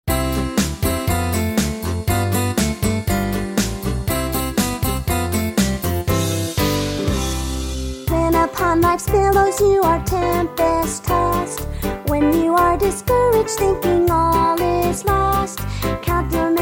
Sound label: Childrens Choir